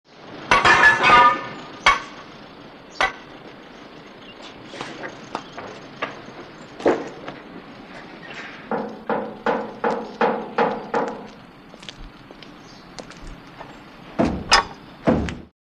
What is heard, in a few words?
Construction Site Atmosphere; Building Site Atmosphere. Metal Clanks And Bangs. Not Busy.